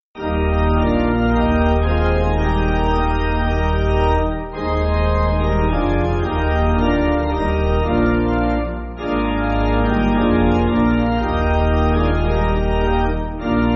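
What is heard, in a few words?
Pipe, Pipe Organ, Music Backing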